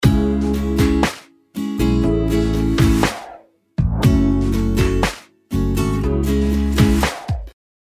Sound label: ringtone